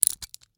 Rachet, vanadium steel, 8in, standard, locking, clicking, mechanical, new, socket release, rotate; Eiravaein Works; Vaeyan IV